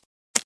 grenade breaklight delay

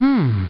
humm; BattleSNDS

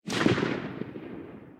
echo town

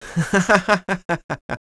LAUGH; BattleSNDS

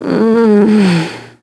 DYING; battlesnds

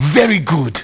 LAUGH; BattleSNDS

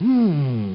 humm; BattleSNDS

